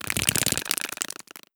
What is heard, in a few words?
idle clicks, headcrab black; creatures; vox